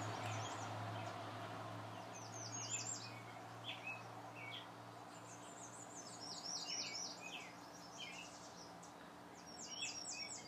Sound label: Birdies